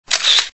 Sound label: Camera; Xillion; GC320